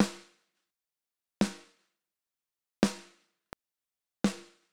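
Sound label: SN tran dry, snare drum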